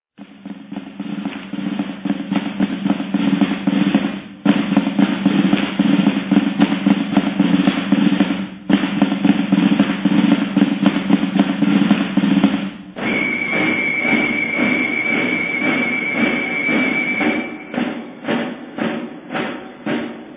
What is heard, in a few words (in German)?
Grosser Zapfenstreich